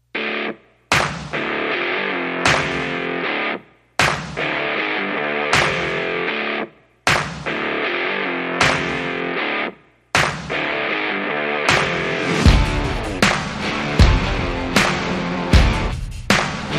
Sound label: No Vocals